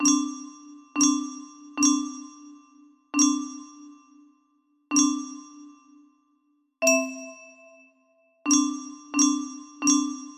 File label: melody, music box